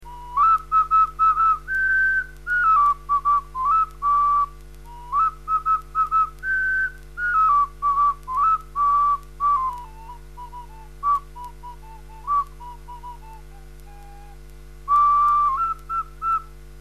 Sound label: ocarina carnavalito; ceramica